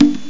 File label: bongo hi